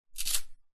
household washing detergent power tip into washing machine detergent drawer; zapsplat